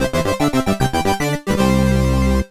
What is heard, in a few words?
Level Up; Obsidian Prince